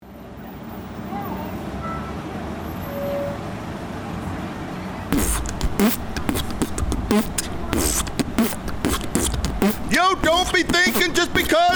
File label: Hip Hop; Hip Hop Easter; Hallmark Animation: Hip Hop Easter Rap